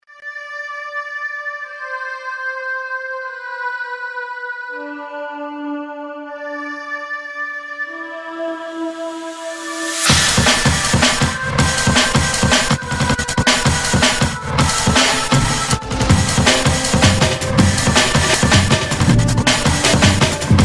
Drum & Bass; Pop

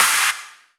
Claps

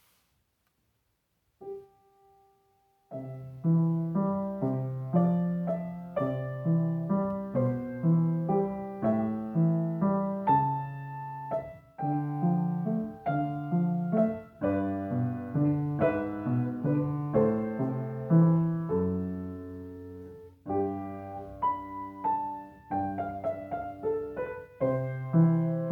Nocturne - piano

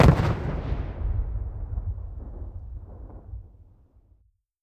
city battle

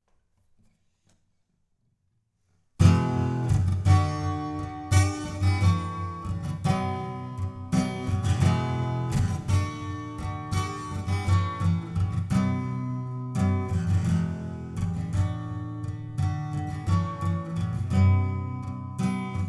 Blues, Instrumental

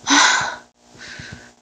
Breath; Lewd SFX

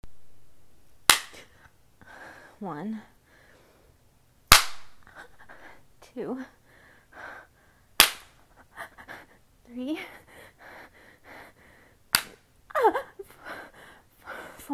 5 Paddles

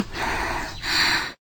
Grunt; Lewd SFX

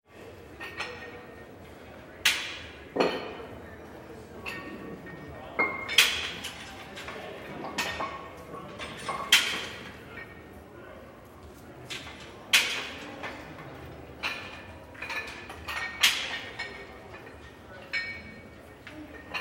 Gym Ambience

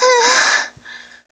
Moan

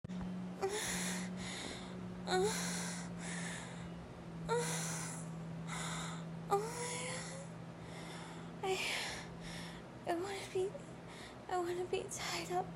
moaning; begging to be tied up and used; Erotic audio

